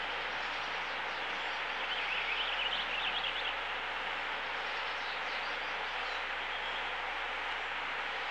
brook; ambients

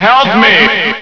Damaged Appears; Maelstrom 1.3

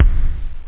house drums bass; OctaMED SoundStudio V1

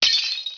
drop glass; Heretic II Demo

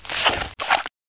get bow; Heretic II; Weapons

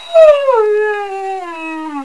DOG SCARED

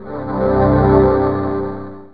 Symphonic; ComicBase 3

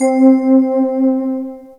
C3 NEWAGE

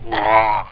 gag; WCWDEMO

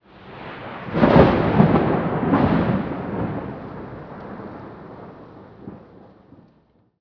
thunder; SpecOps2 MPlayer Demo

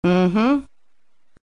Female Mm Hum; Survivor The Interactive Game; Player

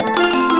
weird

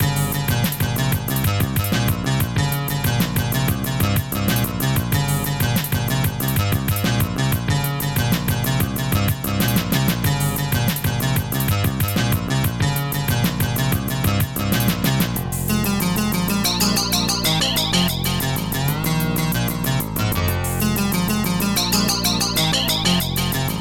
funky short; OctaMED SoundStudio V1; mug-members

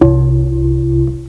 Bong; Cloudz 1.0.3

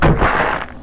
shot tree far; Bolo

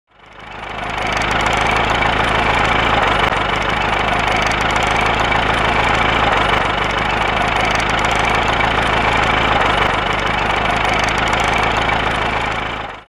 benz; InventorLabs - Transportation; ambient

